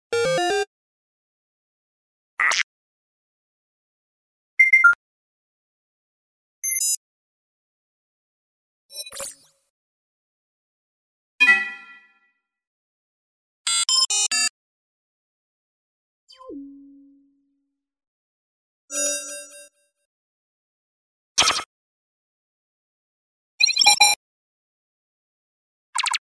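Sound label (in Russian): высокотехнологичные 11 различных ui кликов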